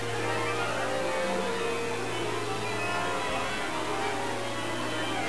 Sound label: song; theaterchat